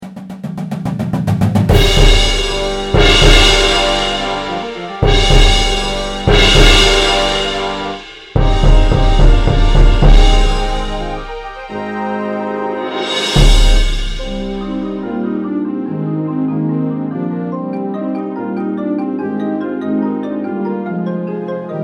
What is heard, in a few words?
WINDS PIT